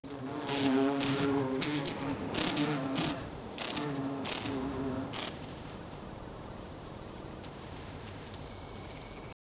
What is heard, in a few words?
fireflies buzzing faintly